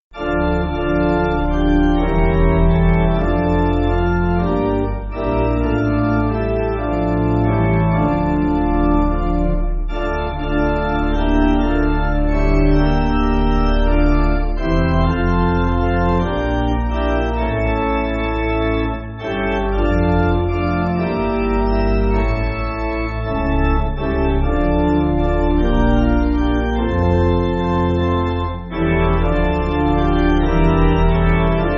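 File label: Pipe, Music Backing Files for Churches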